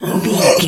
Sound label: bear pain hurt groan